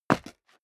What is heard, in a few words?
step leather shoes concrete grit single stair down; schritte